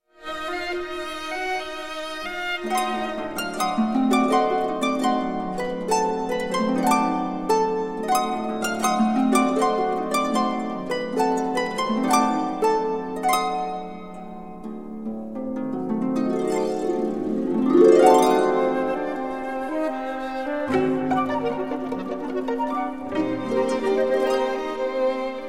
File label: introduction and allegro for harp flute clarinet and string quartet; maurice ravel